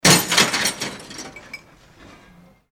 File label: mixed crash with glass